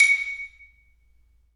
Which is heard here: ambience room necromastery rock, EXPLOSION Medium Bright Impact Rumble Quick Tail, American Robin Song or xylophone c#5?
xylophone c#5